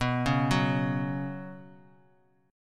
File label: B Suspended 2nd; arpeggiated; JGuitar